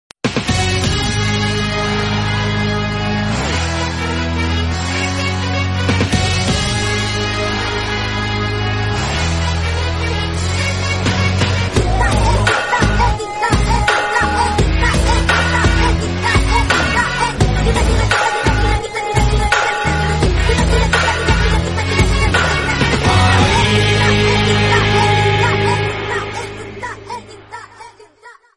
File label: telugu remix ringtones